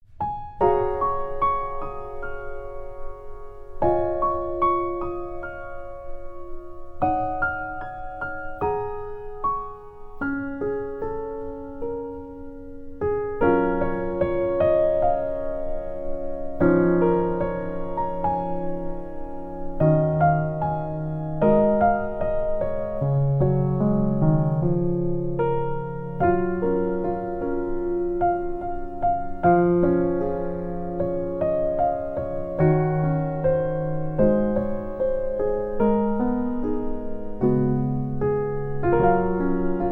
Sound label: Cherryblossom After Winter Piano Version